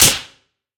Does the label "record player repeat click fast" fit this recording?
No